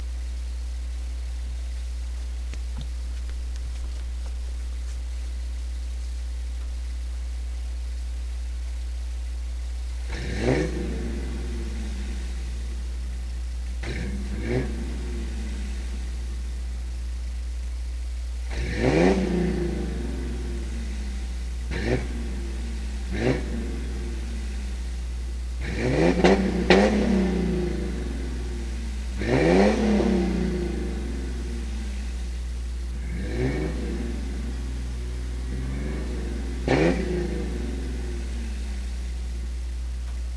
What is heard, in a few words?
mustang 3.8, 50 series dual flowmasters; 1995 Ford Mustang